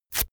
notepad sheet tear up fast

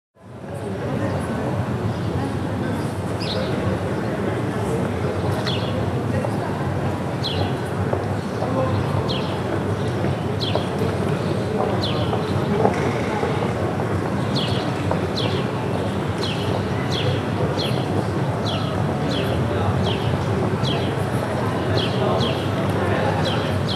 in front of the church

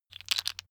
tiles wood

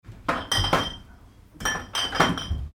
bottle packing

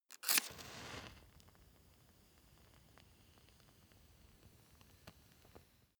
match light

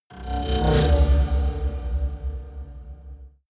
haunted strings roll deep

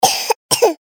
cough

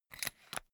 umbrella telescopic retract

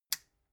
metal button push power on

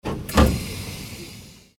underground door open

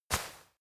step walk textile light

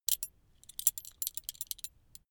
metal belt buckle clatter long soft

